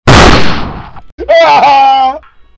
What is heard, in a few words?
gunshot